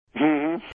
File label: Mmm Hmm